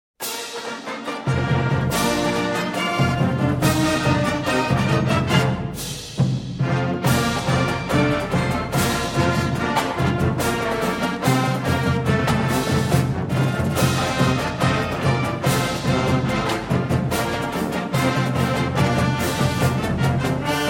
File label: fight song, marching owls; faufightsong